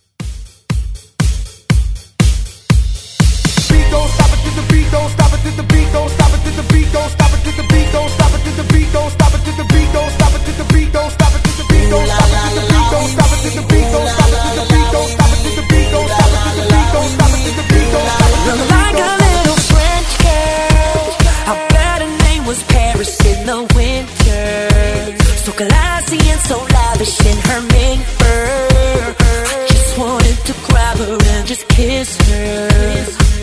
120 bpm; DJDOLLS

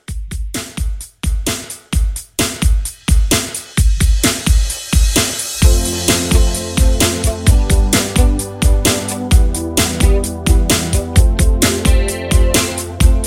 80s Rock Re Drum

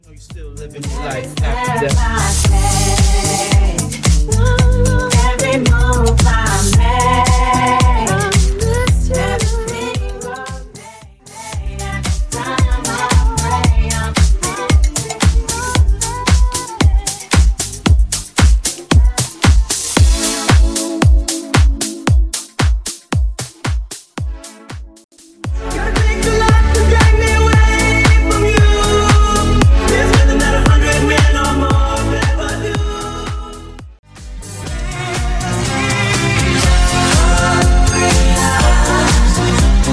Old School Classic House Redrum Megamix

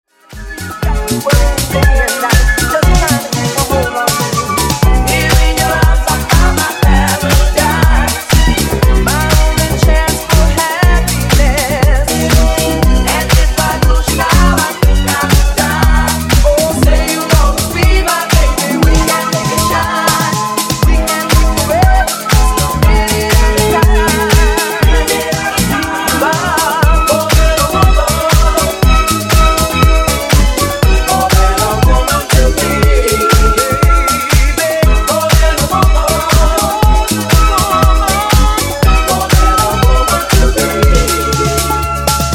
Funk Nu Disco House 120Bpm

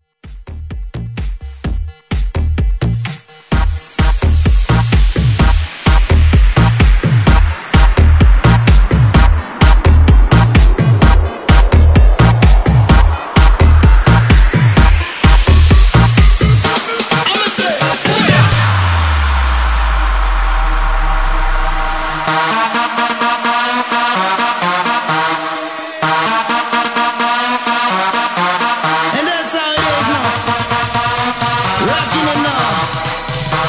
128 bpm break mix; DJDOLLS

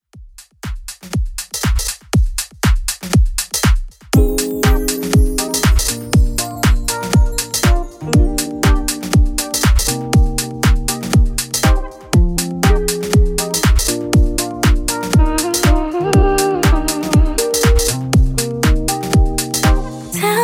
Tiktok Redrum 120 Bpm Clean

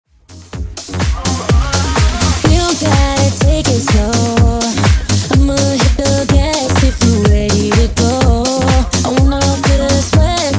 Funk Bootleg Redrum 121 125Bpm

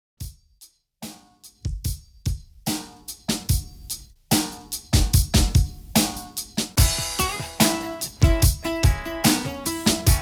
REDRUM 73 BPM

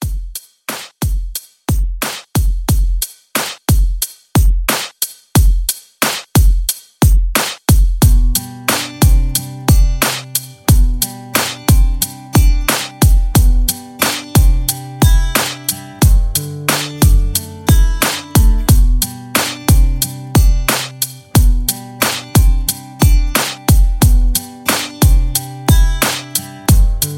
Hits Re Drum 90

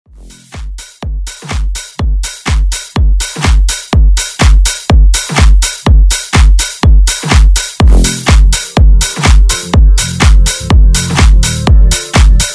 Dance; Redrum

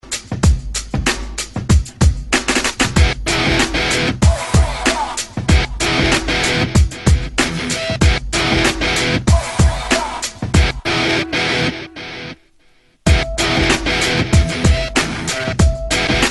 Alternative Rock 95Bpm; DJDOLLS

